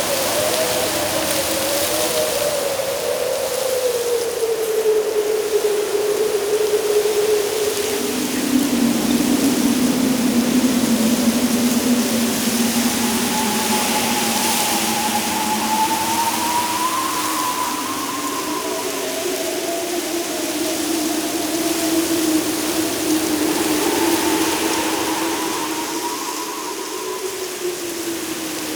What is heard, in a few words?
Exterior Foreground Field Angry Jealous Loop; Warframe; Duviri